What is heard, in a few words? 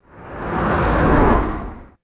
fast breathy whoosh with doppler drop